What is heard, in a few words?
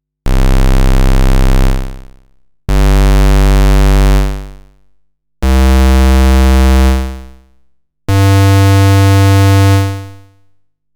SIGNAL FLOW Moog Model 15 iOS